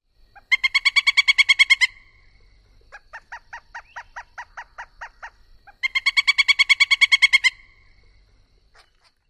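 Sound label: short calls, Pileated Woodpecker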